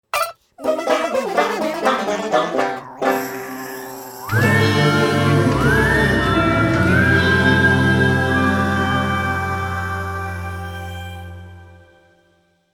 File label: death gameover; SMW